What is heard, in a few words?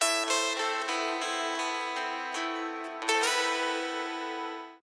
guitar riff, telephone